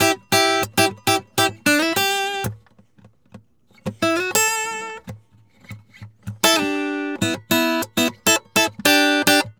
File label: GUITAR SAMBA